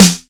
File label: dry snare single hit f key